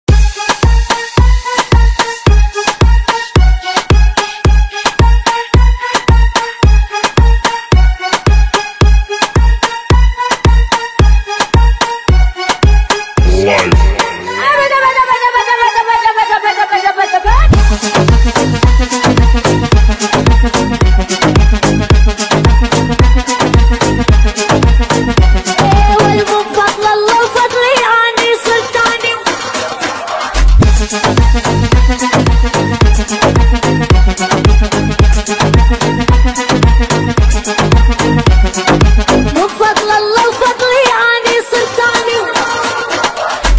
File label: slap remix arabic